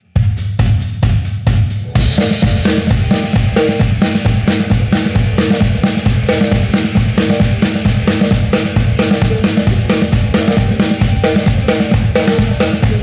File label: drums; Indian Song